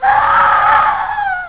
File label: f scream; duke